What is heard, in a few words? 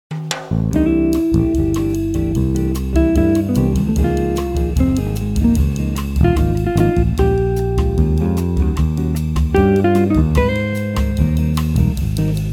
Guitar, Bass, Drums